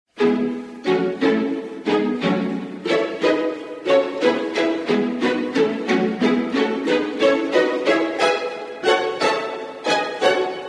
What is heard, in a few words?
pizzicato intro; ringtone